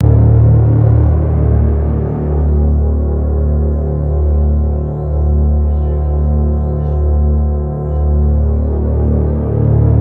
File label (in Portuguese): Sfx Maos Flutuando Loud; Neon Souls; PS4 PS5 switch windows xbox one xbox series xs